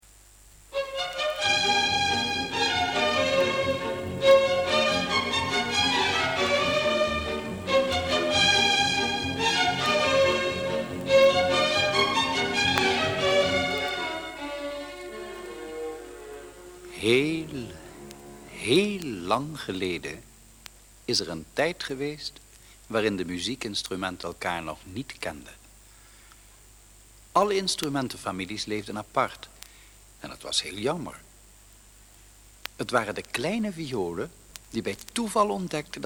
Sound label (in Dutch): piccolo en saxofragment